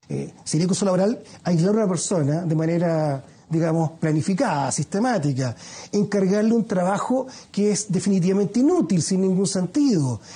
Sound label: vocals